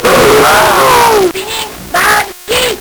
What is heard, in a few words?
Nintendo Gameboy LSDJ